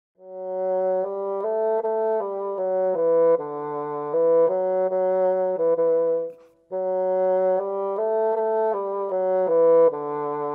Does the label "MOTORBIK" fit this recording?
No